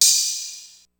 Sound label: Dance Ride, Ensoniq ASR-X Pro